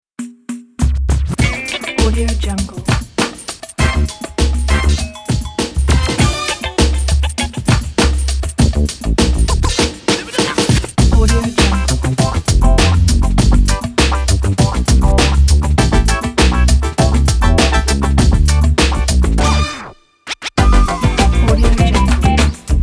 logo brazilian samba funk

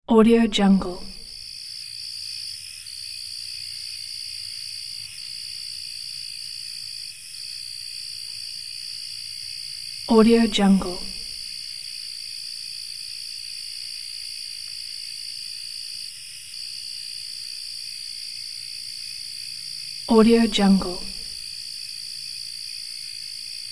crickets and grasshoppers; sound ideas

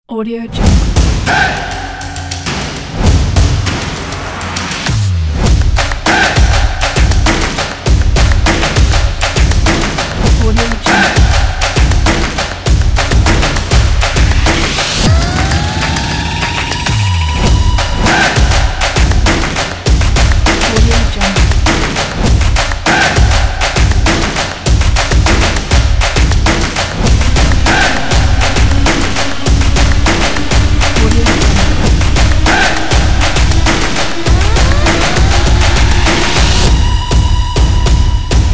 drumming

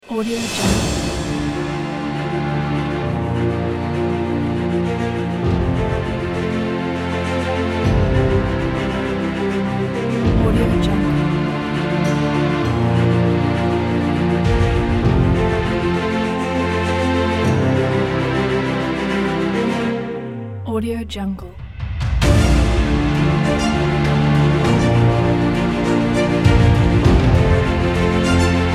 epic

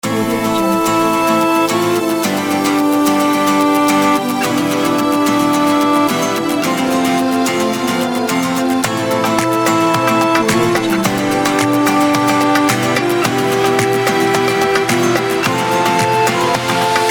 arabic modern

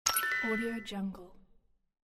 gems gained

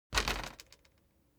transportation bicycle street bump rear

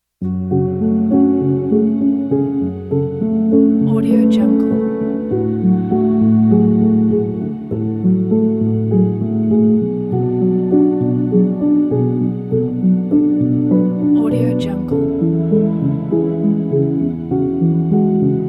the sad piano music

